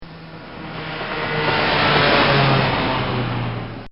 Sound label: Bmw Drive By Fast, motorcycle; Motorcycles; Vehicles